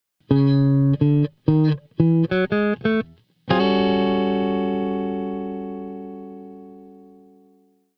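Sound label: Blues Guitar; Ending; Pro Tools Essential Loops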